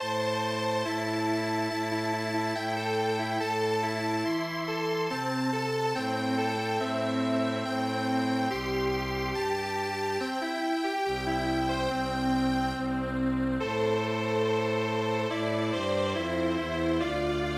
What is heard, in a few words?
Komoriuta strings